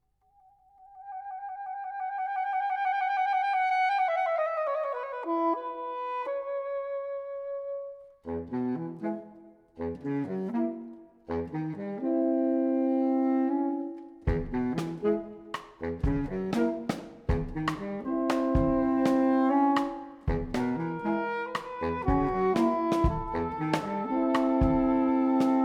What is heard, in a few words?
Off Waltz